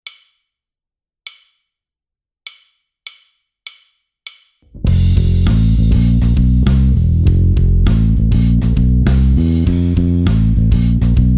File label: bass phrase